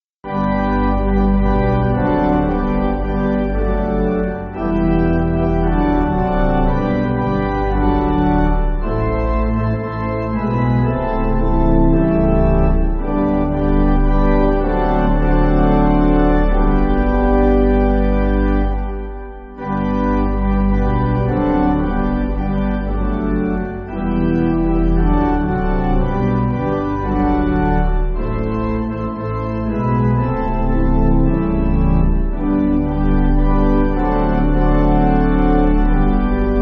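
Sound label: Pipe Organ; God The Father; Lebbaeus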